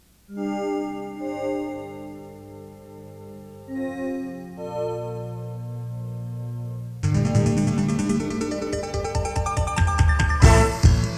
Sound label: fanfare theme; Lego Island cassette quality 1997